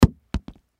Food Melon Drop, Melon Drop Fall Bounce Thud Fruit; Household; Foley; Blastwave